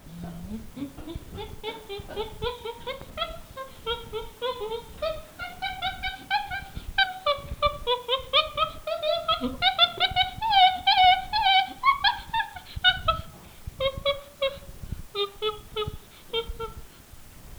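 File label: chimpanzee, チンパンジー 鳴き声; 採食中の低い声から、1キロメートル四方に届くほどの叫び声まで、十数種類の音声を使い分けるといわれています。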